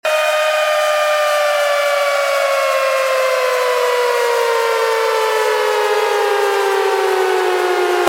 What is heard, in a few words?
tornado warning siren